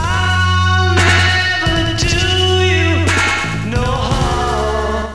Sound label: sol pain